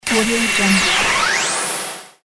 laser beam

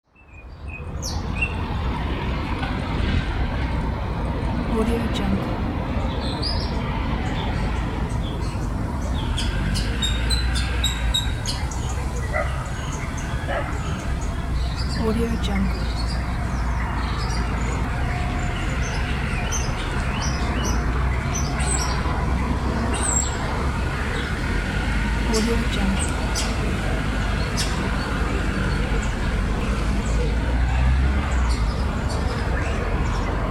city ambiance with birds dog and cars

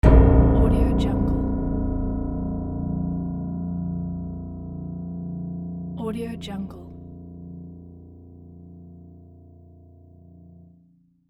dark piano impact

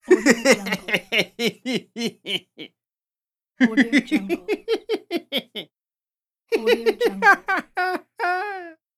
men laugh

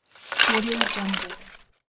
sticks dropped; electronplanet

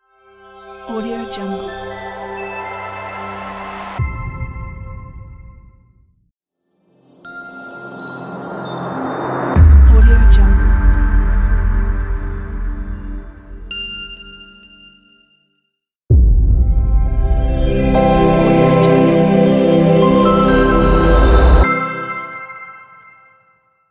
short ambient logo intro pack

